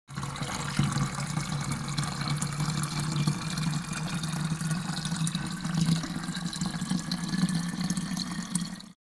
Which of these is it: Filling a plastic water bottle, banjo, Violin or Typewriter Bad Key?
Filling a plastic water bottle